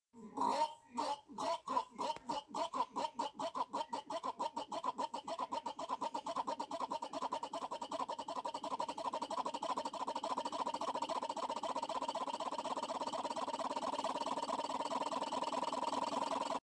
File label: Gulpgulpgulp Engine; Meme